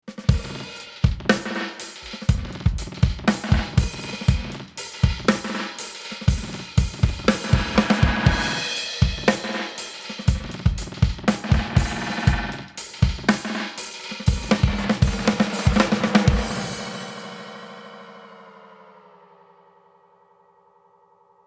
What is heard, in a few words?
Binson Echorec Drums Swell extreme FX; T-REX